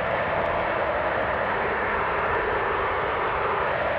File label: sparse soundscape samples; ReLooped